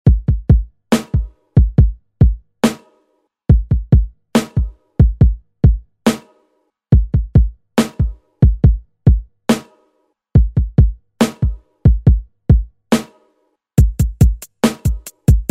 Rock Redrum; Deejay Hit

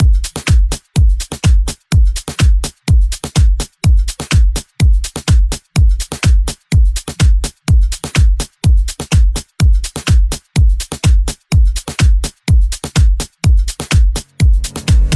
Pop Redrum; Remixfreakz

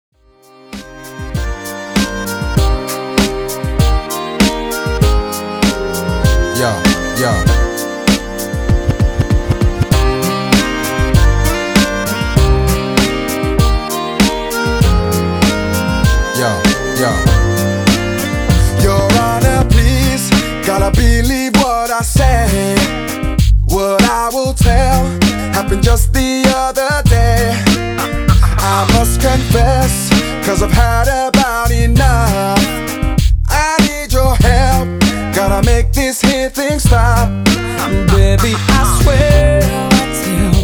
Extended Redrum

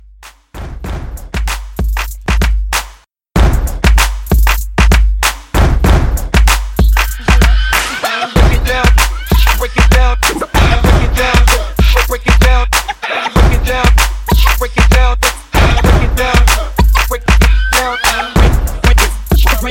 Old School Mashup

